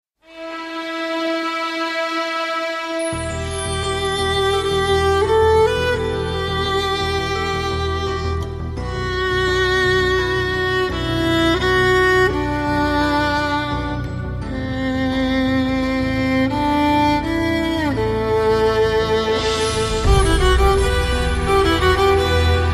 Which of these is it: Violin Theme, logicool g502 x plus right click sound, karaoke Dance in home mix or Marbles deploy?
Violin Theme